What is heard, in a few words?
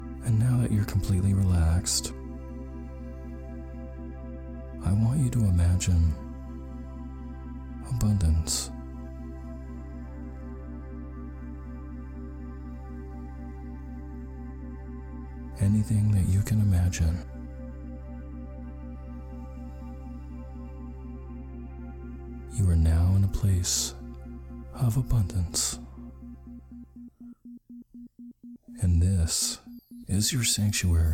abundance With Isochronic Tones